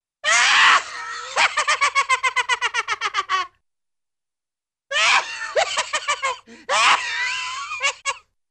Evil Demented Little Man Or Kid Laughing Rapidly, Cartoon; Hollywood Edge; Cartoon Trax